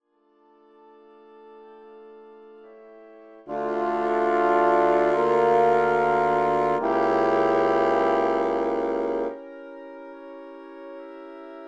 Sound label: Organ